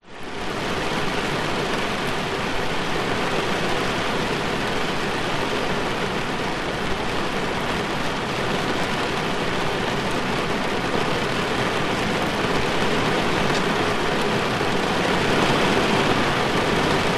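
vehicles car rain medium on window parked engine off interior pov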